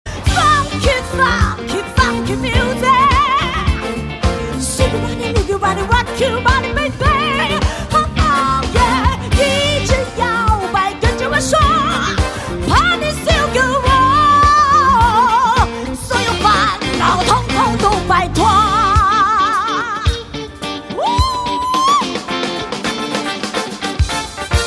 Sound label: Funky Music